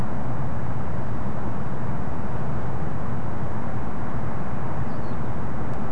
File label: unid three note downward call, nocturnal flight call; nocturnal flight call project in beijing autumn 2021